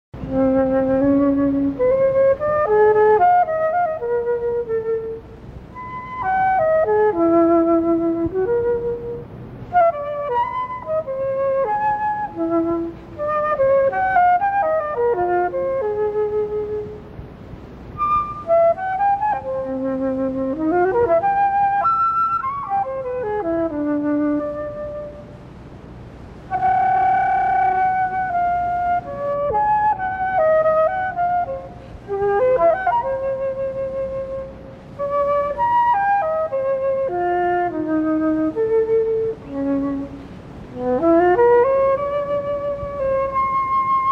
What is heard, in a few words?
Flute Solo